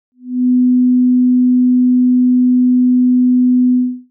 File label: 250Hz phase L50 R00